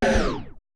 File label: scratch; Roland MC-909